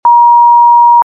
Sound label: Steady